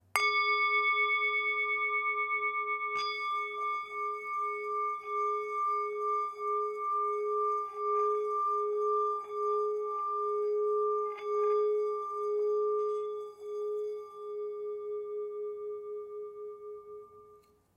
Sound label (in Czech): tibetska misa; Saga; palička na hraní; Obrácený zvon, meditační mísa, léčivá mísa, himalájská zpívající mísa, tibetská mísa